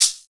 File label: Shaker African; Seventh Beats